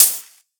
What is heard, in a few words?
Hihat, Psytrance; One Shots